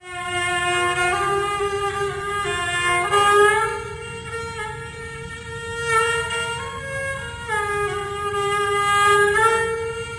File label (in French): Rebab, les violons